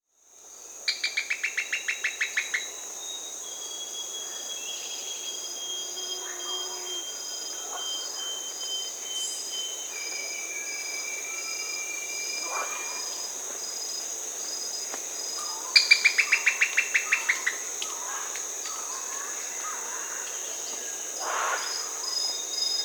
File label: White-cheeked Tody-Tyrant (Poecilotriccus albifacies), Peru; S. America